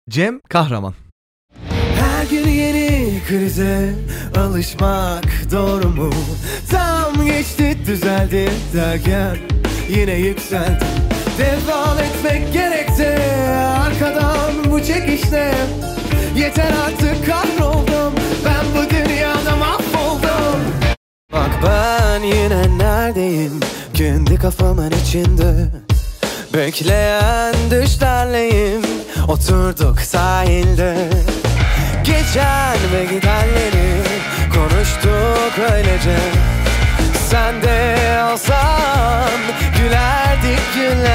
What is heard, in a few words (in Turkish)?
vokal